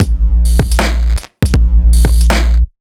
Kit Wonk, 85bpm; off the grid

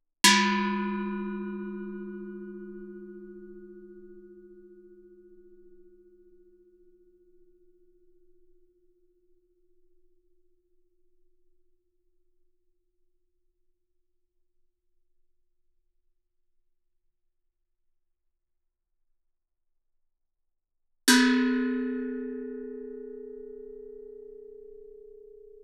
Gamelan; Propeller Island - Complete Gamelan

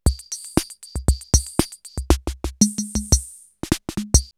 CR78 BeatBox, drumloops, DrumMachines; X-Static Goldmine

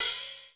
DRY HAT; DRY KITS; Crypton; DrumBase5000

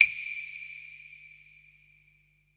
SIERRA PER; Best Service Advanced Media Trax Building Blocks

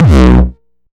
909 Dist Reso - B1, Kicks; Drums; Mbase 11 Kicks

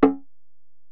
EPerc, Alesis DM-PRO; 300 Drum Machines

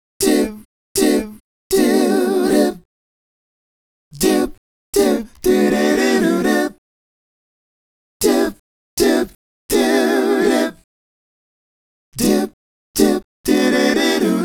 Male Group, Vocal

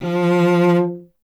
STR VC F 0, Cello; Miroslav Vitous; Solo Instruments VOL-1

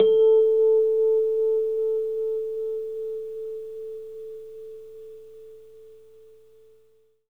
Rhodes A; 3D Sound; 3D Keys VOL-1

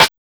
125 DRM, Fresh Disco House

